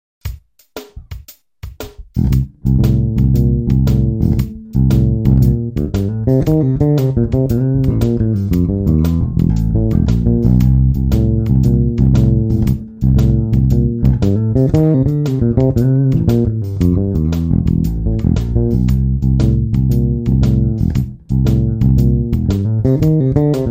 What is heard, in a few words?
C7 Shuffle bass fill